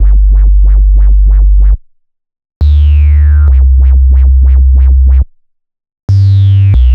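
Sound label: Bpm138 Bass Loop G major C major; Ocean; Prime Loops; Dubstep Illusions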